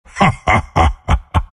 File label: bearform laugh; Lone Druid